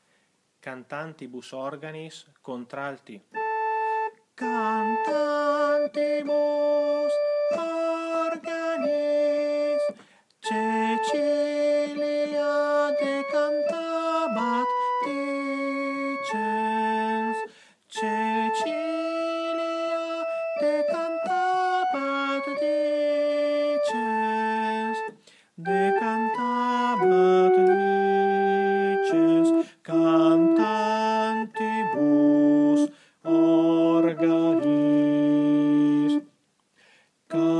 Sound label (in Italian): Contralti